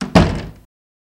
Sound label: Door Close Hvy Bathr